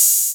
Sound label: HAT